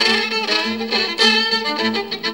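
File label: VIOLIN GROOVE; Best Service; World Colours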